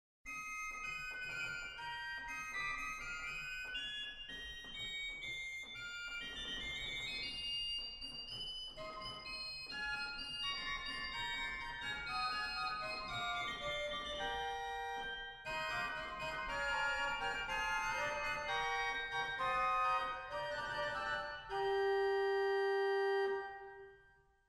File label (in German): Sesqvialtera, organ; waltershausen